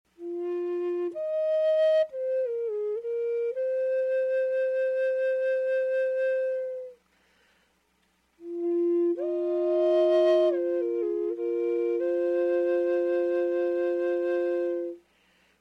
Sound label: Drone Large F Eastern Cedar, flutes; FlutesDrones